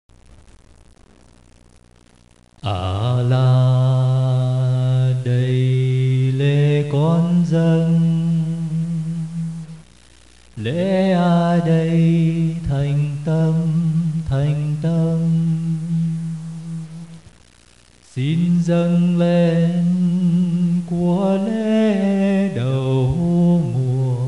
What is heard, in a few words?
alto